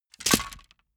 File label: wood sticks small break metal small